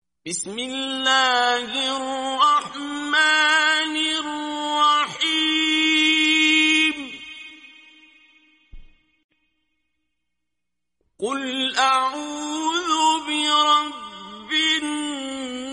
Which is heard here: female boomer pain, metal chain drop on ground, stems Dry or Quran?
Quran